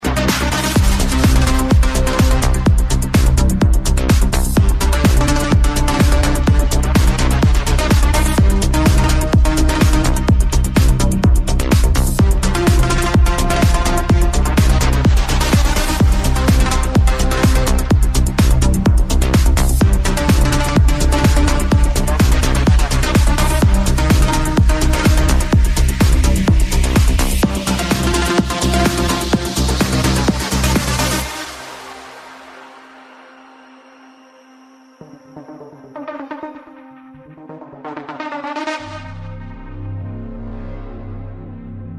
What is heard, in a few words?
progressive techno house